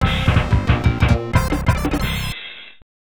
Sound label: Jackpot